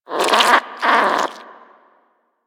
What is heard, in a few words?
Vocal Snore; Warframe; Hunting Critters; Nexifera